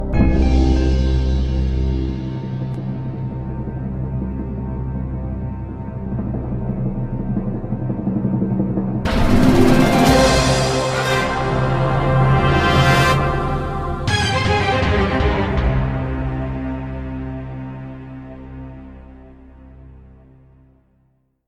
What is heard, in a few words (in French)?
Bonne réponse; Qui veut gagner des millions; qui veut devenir ecomobile; Lumbres